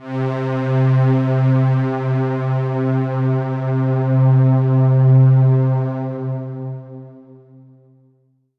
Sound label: PAD Vector (C), Melodic One Shots; Cymatics; ANALOG EVOLUTION Beta